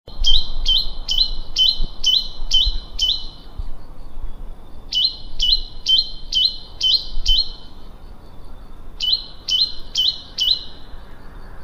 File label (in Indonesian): suara asli perenjak gunung